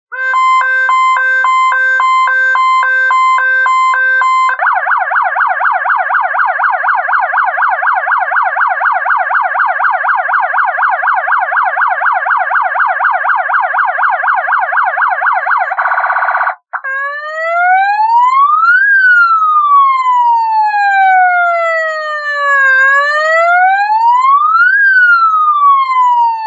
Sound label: tune